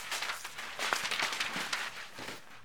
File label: Paper Rustle; SFX Kit; Foley - Misc